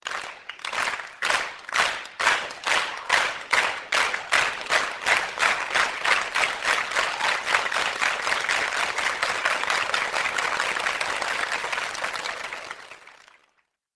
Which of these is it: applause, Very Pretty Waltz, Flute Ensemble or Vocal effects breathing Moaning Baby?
applause